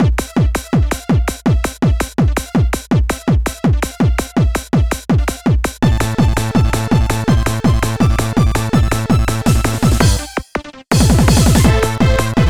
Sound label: Para-Para Stage (Karaoke); pinky street kira kira music night pop town gamerip